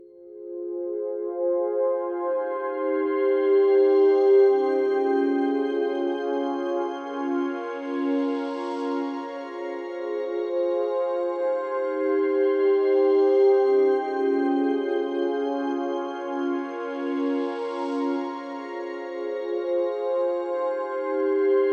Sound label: 105bpm Distance Multiple Samples